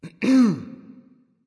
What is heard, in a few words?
clearthroat; erlangshen